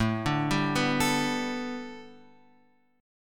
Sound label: A 9th Suspended 4th, Guitar; arpeggiated